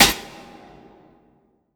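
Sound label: snare drum, drum & bass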